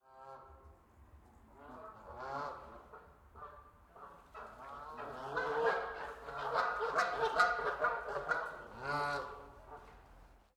Bird Fowl Approaching through tunnel, vocals perspective, Geese; Excited; 344 Audio